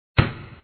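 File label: ball bounce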